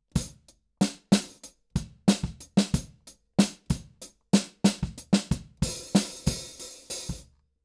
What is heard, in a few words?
KIT